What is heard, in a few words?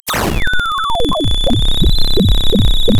pop